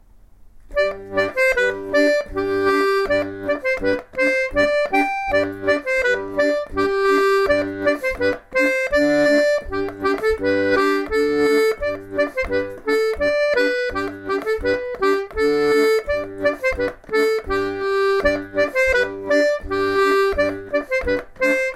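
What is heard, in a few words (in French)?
hanter dro, accordéon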